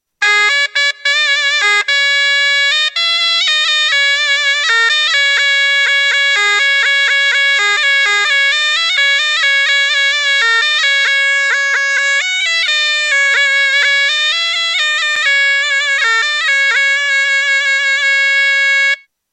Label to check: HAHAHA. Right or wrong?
Wrong